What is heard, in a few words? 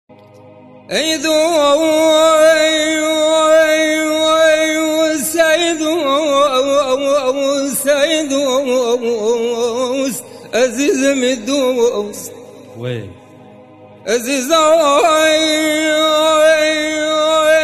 music sharveh dashti